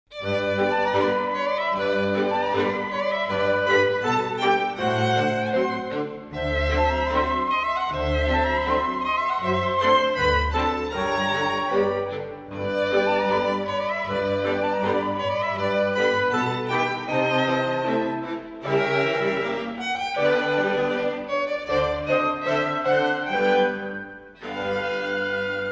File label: Mazurka; Mazurka i dobbel ring